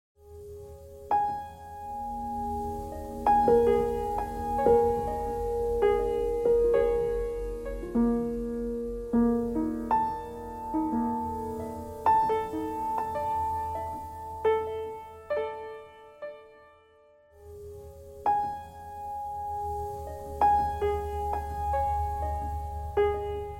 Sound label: piano noise